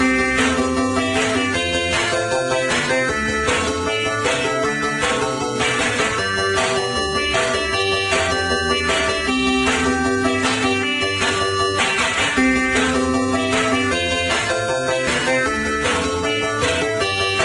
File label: Ringtone